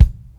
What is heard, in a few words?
tight low end steel kick drum sample b key